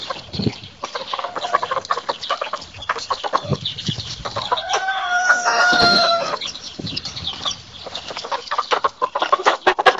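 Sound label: rooster in henhouse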